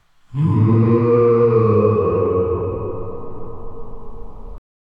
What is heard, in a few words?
chilling and echoing horr